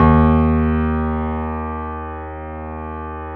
D#1, Keyboards Acoustic, GRANDP; AKAI; USB Soundscan vol.17

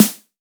SD, Waldorf Blofeld

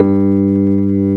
SUIT AMP G1, Keyboards Old School; USB Soundscan vol.09; AKAI

